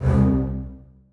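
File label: basses staccato c2; Sonatina Symphonic Orchestra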